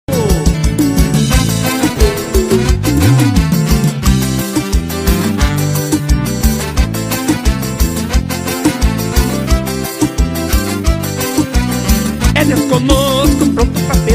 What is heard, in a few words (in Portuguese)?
gospel ao vivo